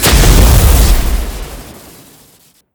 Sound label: spellblade aspect, Magic